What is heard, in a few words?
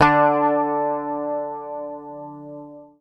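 bass, D3, right channel; PICK; Sampleheads; Will Lee Bass Library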